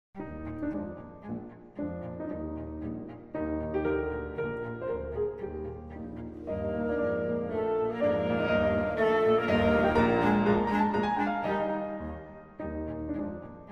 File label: Trio in E-flat Major, Tempo di Menuetto; Ludwig Van Beethoven